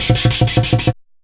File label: FLUTE GRV; Best Service; World Colours